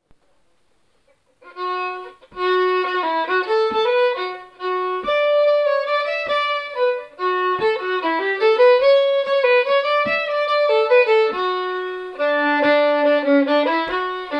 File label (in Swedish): Majas andrastämma